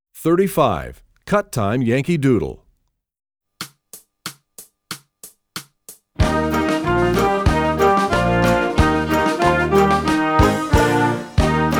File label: Cut Time Yankee Doodle, trombone; essential elements